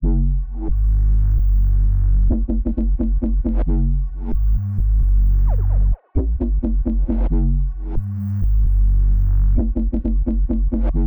132 BPM F# Min, Melodies UK Garage; Cymatics; GHOST (Beta Pack)

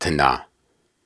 Syllables and Imaginary Words, Viking Vocal, Hard

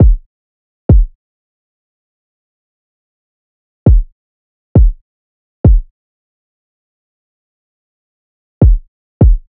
Drum Loop 101 BPM Kick; Loop Stems; Cymatics; Vibes II Drum Loop Collection; Windy

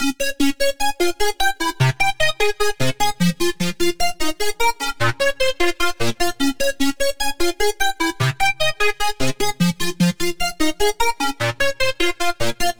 Dubstep Arp Loop 150 BPM F Min; Arp Loops; Synths; Cymatics; Dubstep Starter Pack

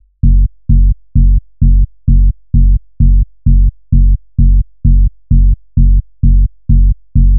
Bass Loop 130 F#, Techno; Ghosthack; Free Techno Sounds 2024

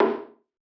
Vintage Percussion Room Tone; Cymatics; Mystery Pack Vol 4

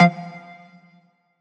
Riddim Melodic Hit F; One-Shots; Dawn; Ghosthack; Riddim Essentials Volume 2

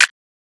Crisp Snap; Cymatics; PHARAOH Beta Pack; Pharaoh Premium Drum Samples (BETA)

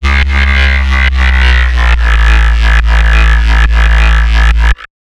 Bassline, Dubstep and Trap

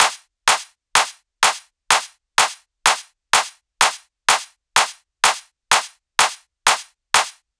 Future Rave Kit 126bpm Clap; Drums; EDM Drums; Drum Kits; Stems; Ghosthack; Ultimate EDM Drums; Drum Mega Bundle; Future Rave Kit 07

